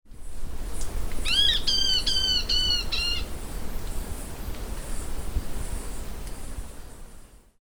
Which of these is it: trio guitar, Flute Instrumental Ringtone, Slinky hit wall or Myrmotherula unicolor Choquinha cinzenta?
Myrmotherula unicolor Choquinha cinzenta